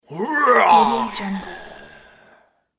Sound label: villain arrgh scream